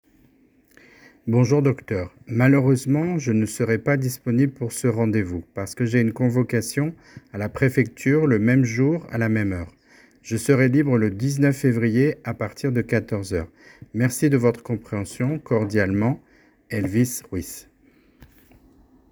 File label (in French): voix